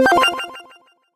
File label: equip emote